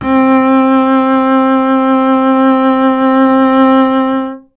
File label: STR CBS, Solo Instruments; Cbas; Miroslav Vitous - Solo Instruments VOL-2